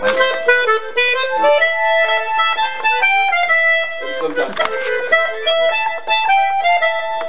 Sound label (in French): Chapelloise variation partie 1